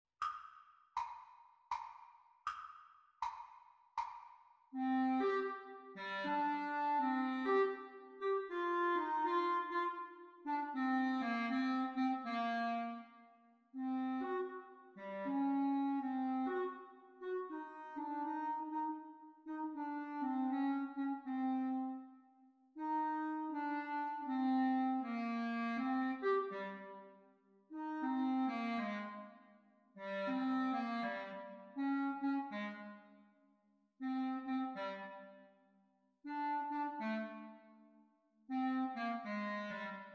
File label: Practice speed Clarinet Duets; Grade 6; The Teacher part